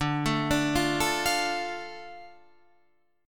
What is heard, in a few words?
D Minor D Guitar Half Step Down